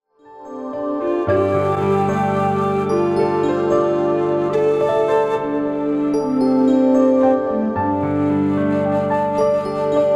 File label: Music From A Waterfall